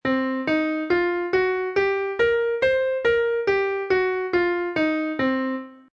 minor blues

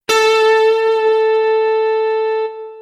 Elec Gtr

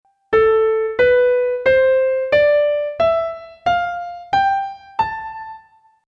Aeolian

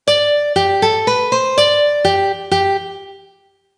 Minuet In G

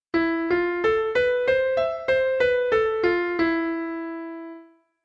E Japanese Pentatonic

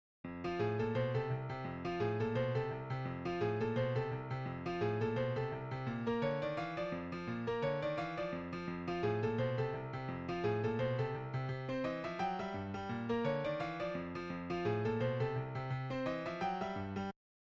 Simple Blues In F; 16Blues

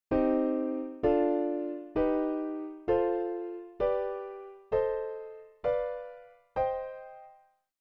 Triads MM

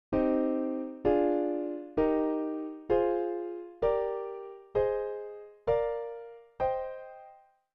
Triads

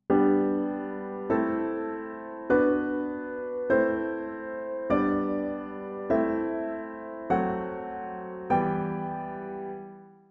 chords

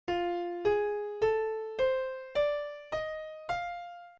Blues F; 16Blues

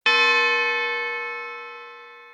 Bell

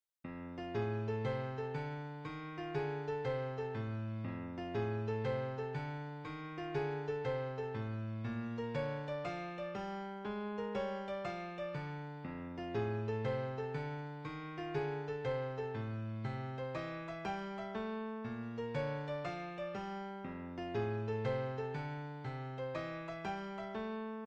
Simple Blues In F; 16Blues

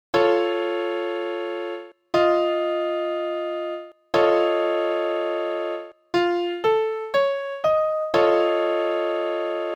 Aug Min7

